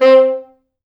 C3, Pop Brass; Best Service ProSamples vol.19; AKAI

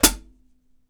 FOOT, Drumworks; Sampleheads - New York City Drumworks VOL-1

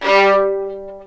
G3, VLNS ARCO; East West; The Ultimate String Collection

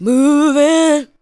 ADLIBS

